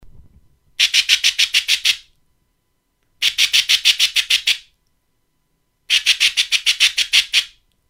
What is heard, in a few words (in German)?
Elsterlocker freundlich; kraehenlocker und jagdlocker